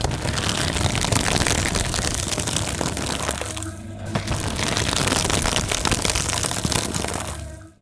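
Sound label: shredder; 1000fx; OFFICE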